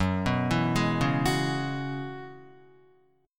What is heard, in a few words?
Gb 7th Suspended 2nd Suspended 4th Gb down Guitar; arpeggiated; JGuitar